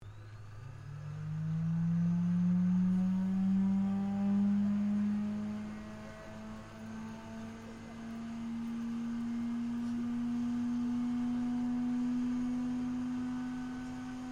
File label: AIRCRAFT AT-6D HARVARD MK III STARTUP DIFFICULT TAXI; Harvard Training Aricraft; Airplanes; Vehicles